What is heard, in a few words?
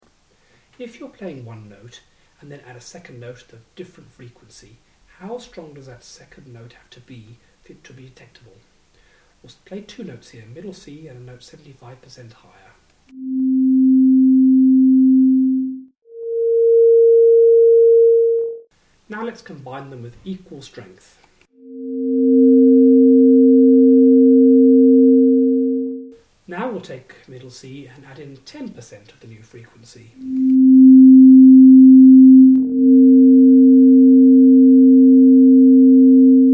two notes power